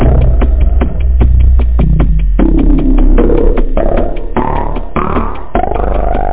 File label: FLAVA LOOP; PhatTrax